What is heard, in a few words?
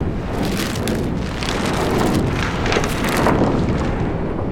paper swirl